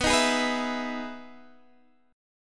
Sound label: E Major 7th B, chord